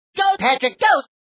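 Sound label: cheerleader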